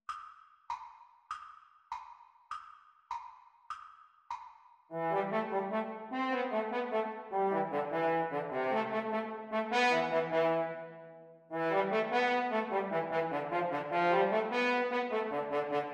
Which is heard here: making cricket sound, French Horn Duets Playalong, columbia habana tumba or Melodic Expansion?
French Horn Duets Playalong